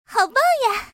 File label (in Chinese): zhongjiang